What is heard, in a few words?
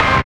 RETRO HIT, Synthy Tonal; Distored Reality